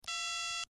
Telephone; Cell Phone Number Key Beeps From One To Nine.